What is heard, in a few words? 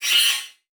Multimedia Internet CD-Rom Flash Element